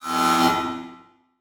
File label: Title Transition Bell; Adobe; Production Element